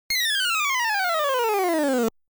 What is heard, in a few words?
Alien Flying; Galaga